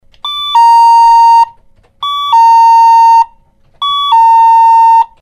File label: dingdong